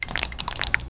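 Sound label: water pile dragging mouse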